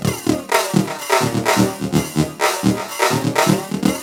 LOOP, TECHNO; TECHNO-BOX; ELEC-LOOPS

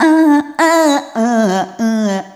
Rhythmic A

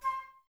flute, Wood Winds, Flutes; Miroslav Vitous - Woodwind Brass Ensemble

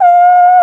BARITON; Best Service; Alpine Volksmusik

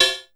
hat, drum; Masterbits - Total Zone

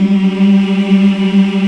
African Mm m; Roland LCDP11 Africa VOL-1

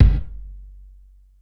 Gated Kick, Gated Reverb; Univerb; musicradar

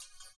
CYM, Ethno Techno; ILIO - Ethno Techno

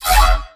bass hit elephant yoink E; Virtual Riot

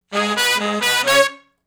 Shuffle Blues Riff (Db) unison; Sections

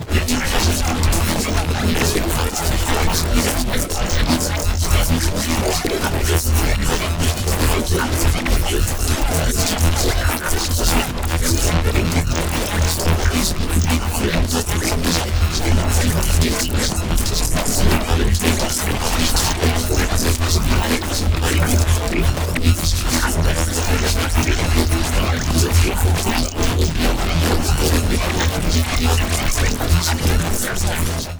Bass Granulize E

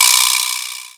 RATTLE; Drum Hits

